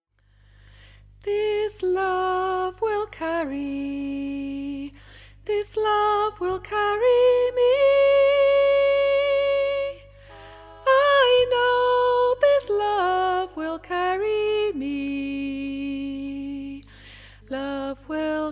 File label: sop, choir